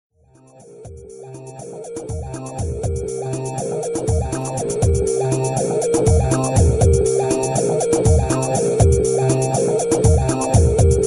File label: acid beats